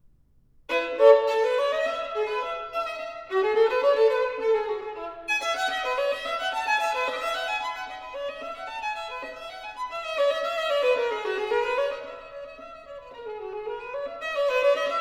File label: Partita No.3 in E, Bourree, Solo Violin; Bach; Sonatas & Partitas for Solo Violin; BWV 1006